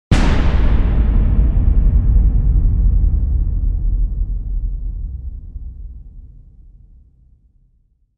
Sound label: Huge explosion; Big Blast; Blasts (Sound Effects:Impacts)